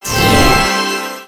Sword

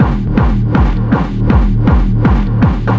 Beats Idle Loop; Anger Foot

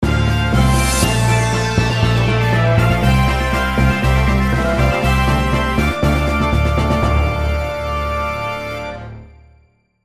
Jingle Stage Start; Gensou Shoujo Wars: Dream of the Stray Dreamer; Hoshi

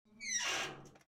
cannon open door; gamerip; Out of Reach: Treasure Royale

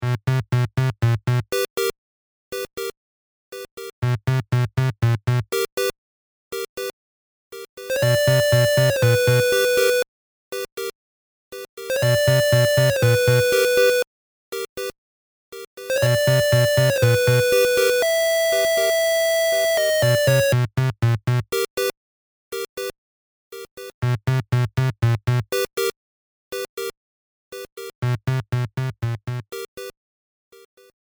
BGM CAVE; Syupro-DX; Android

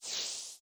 soul pickup

